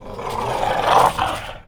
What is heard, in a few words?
DOG; 3D Sound - 3D Keys VOL-1; MEAN 3D